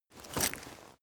leather metal walk normal single

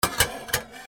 machine burst

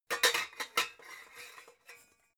pots handle

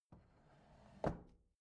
bathroom drawer open soft

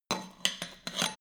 hammer indoors handling

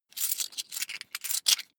coins big clatter fast

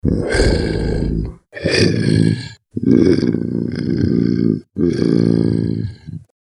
deep creature growl

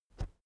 keyboard external space push

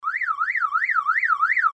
car alarm

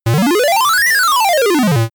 general rise and fall short

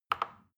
plastic button tiny click soft fast

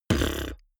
beatbox bass drum wobble damped

